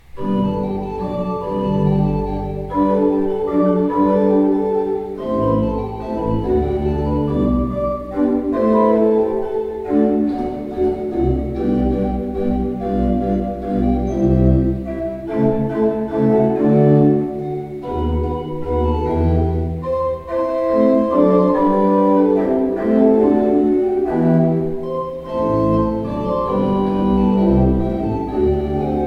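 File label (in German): Kleuker 15 II P, pfeifenorgeln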